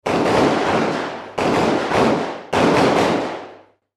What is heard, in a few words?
warfare gunshots semi automatic distant; Gunfire (Semi-Automatic) Distant